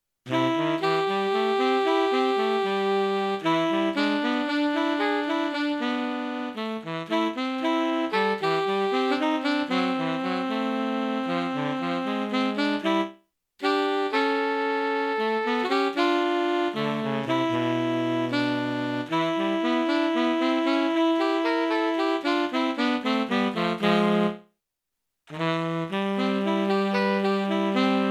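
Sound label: Tenor Sax, STANDARDS TENOR SAX DUETS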